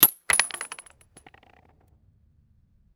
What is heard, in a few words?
brass bullet shell drops on cement